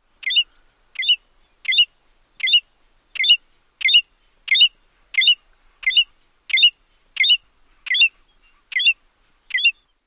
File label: ko-WIT flight calls